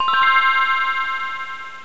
Good Chimes

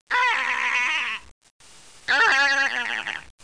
Ha; Bible Fun Pack; NOAH

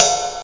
cymbal ride; orchestra, drum

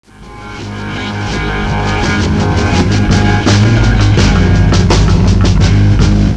Riff; PlayRiffs 1.0.3

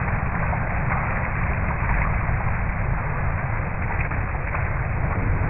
rain; aquakon

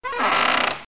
Small creak

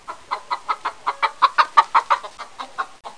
Chicken Cluck; PUZMANIA

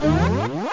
PROMOTE; PlayPac

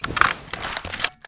heavy weapon forward, weapons foley; Spearhead Demo; MacFormat 2003 June

